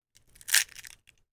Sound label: crack open egg